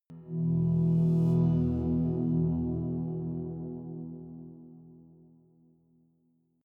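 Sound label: eighties fifth short, pads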